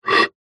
air blow inside small tube pipe short burst recorded at opening; zapsplat